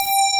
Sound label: UNDER X BASS, Synthsonic; Pro-Rec - Synthsonic; BASSES